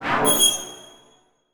Title Transition Blast Energy; Production Element Title Transition

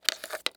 Household Pen Take Out Of Cup

